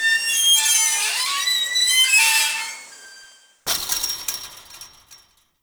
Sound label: Chain Link Fence Door Open; Nineteen Ninety Nine; Doors